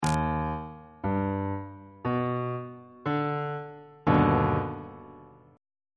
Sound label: e flat augmented major 7th chord on bass clef